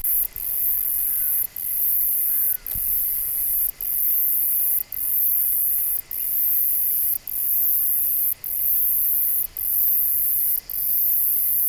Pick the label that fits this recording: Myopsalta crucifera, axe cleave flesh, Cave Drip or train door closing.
Myopsalta crucifera